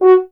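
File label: French Horn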